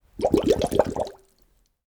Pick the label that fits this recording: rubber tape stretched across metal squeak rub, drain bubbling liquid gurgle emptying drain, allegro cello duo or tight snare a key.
drain bubbling liquid gurgle emptying drain